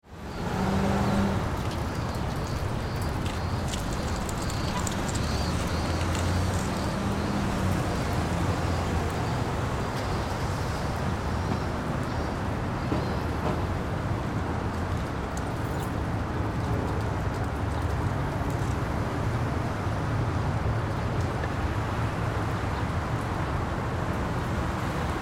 Ambience Parking Lot; City; Cities

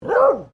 ANIMAL DOG BEAGLE FEMALE BARK; Various domestic dogs; Dogs (Sound Effects:Animals)

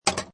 Pinball sound, metal clank with return

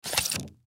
Plastic Sleeve Styrofoam Package Open Lid; Materials; Foley

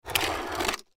Tire Pump Portable, Tire Pump Drag Cement; Air Pumps; Foley; Blastwave

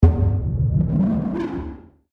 Soft Impact And Whoosh; Andrew Fleming

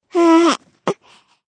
Baby Sound; Newborn baby sound; Babies

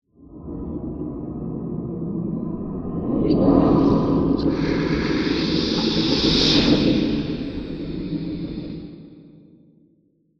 Erie Space Ghost, Science Fiction; Blast Wave

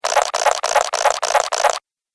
Rapid Repeating Squelchy Sound; Slimy Sound Effects

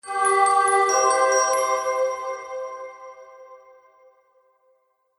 Xmas Ending Choir And Bells; Musical Sound Effects

